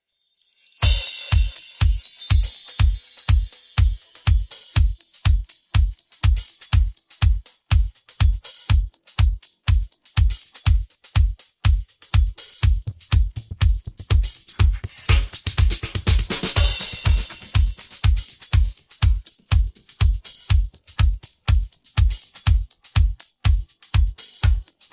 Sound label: drums